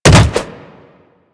Jump Attack Default; Footsteps; Warframe; Tenno; Wall Run

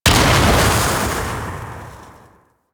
Eruption Fire Burst; Sargas Ruk

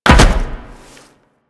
Land Heavy Metal, Footsteps; Warframe; Tenno

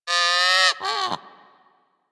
Sea Bird Vocal Captured Idle; Warframe; Hunting Critters; Ostron Sea Bird

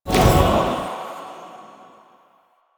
Choir Erupt End; Warframe

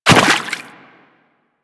Run Water, Footsteps; Warframe; Teshin

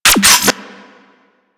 Energy Rifle Reload; Warframe; Weapons

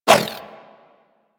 Inf Throwing Star Whoosh, Infested

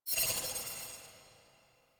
Fairy Weapon Shot Tail; DE; Warframe

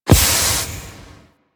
Organ Air Blast; Ambience; Orokin Moon; Warframe

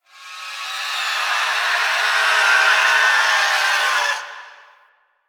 Weave Boss Death Build; Warframe; Cephalon Weave

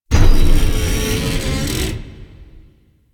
Metal Scrapes And Hits; Ambience; Warframe; Grineer Asteroid

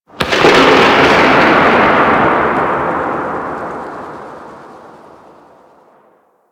Shipyards Thunder One Off, Ambience; Warframe; Grineer Shipyards

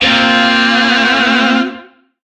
Shawzin Hirajoshi Chord K; Music; DE; Warframe; Lizzie Shawzin

